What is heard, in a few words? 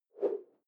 whoosh simple short tonal